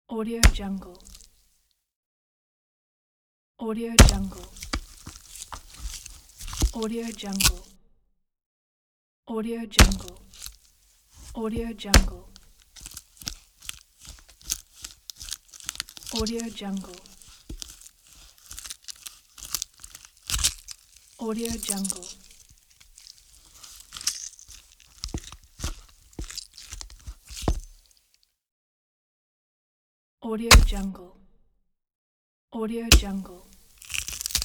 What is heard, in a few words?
knife stabs sound pack; wistansound